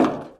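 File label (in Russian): грузный сильный внезапный удар по дереву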